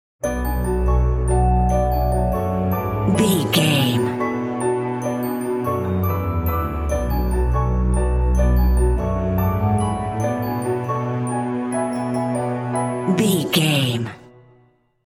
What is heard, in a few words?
beauty unknown bumper